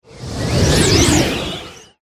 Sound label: atshi buff, pets